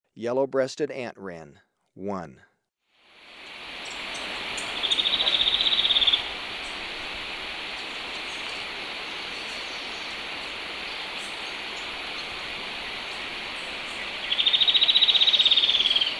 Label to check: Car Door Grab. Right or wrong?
Wrong